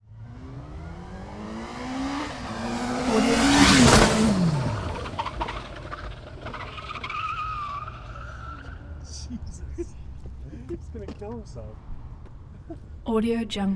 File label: yamaha fz8 passby; soundmorph